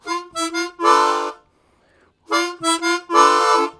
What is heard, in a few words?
Strange Chrom, Harmonica, Riffs; Zero G